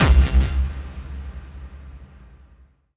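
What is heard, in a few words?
Rave Synth, Dance Mega Jungle Rave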